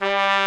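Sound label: brass bright trumpet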